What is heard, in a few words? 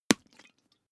hot-water bag punch hard